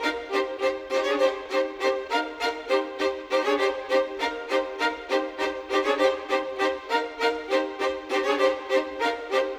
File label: Violins, Orchestral; Sonic Foundry (Sony Creative Software); Orchestral VOL-2; Modern 06